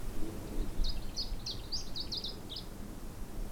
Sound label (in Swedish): vrango song; En sångstrof; Sonogram Artportalen